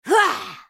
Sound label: spellcast effort; Kennen; Character Voices - English